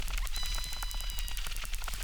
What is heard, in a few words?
Chirp, acquarium